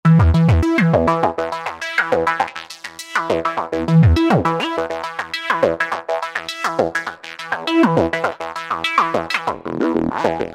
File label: Auto TB-303, Roland JV-2080